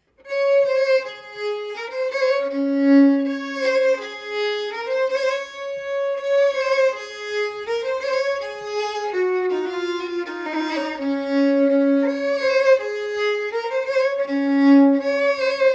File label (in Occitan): vriolon